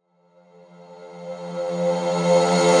SFX Riser F; Overrun; Ghosthack

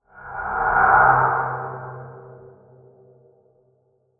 Whisper C; Ghosthack

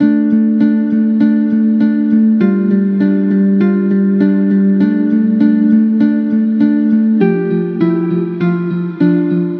Musical Loop 100 A Minor; Consequence; Ghosthack

